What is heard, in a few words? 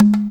BONGO R, Percussion; InVision Interactive; GRUNT